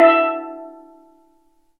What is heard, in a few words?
steel drum; E-MU Producer Series Vol. 2; More Studio Essentials; Composer